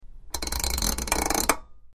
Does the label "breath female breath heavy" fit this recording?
No